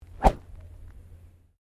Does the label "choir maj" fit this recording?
No